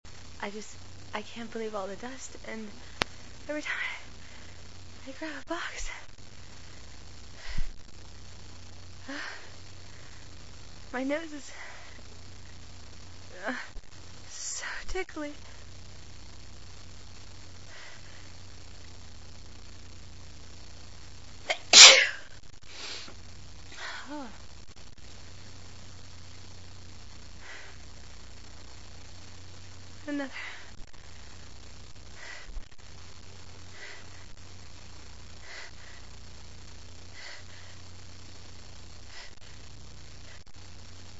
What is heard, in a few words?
dusty boxes, female